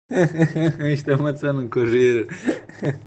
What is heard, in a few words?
eheheheheh